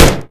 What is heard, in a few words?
new rifle shot; Angry Goy II; SoundEffects